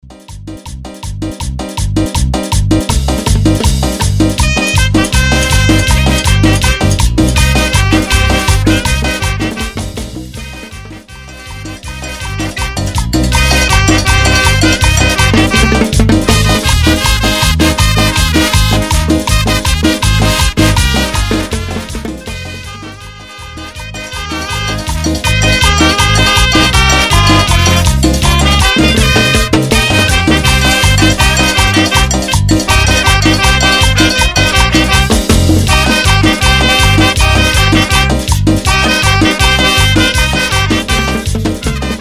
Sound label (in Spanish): banda, Bpm 161